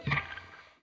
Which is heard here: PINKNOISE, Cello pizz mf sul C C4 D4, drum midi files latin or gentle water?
PINKNOISE